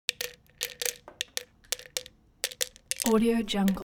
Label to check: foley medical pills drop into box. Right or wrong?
Right